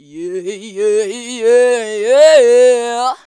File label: VOX, Singin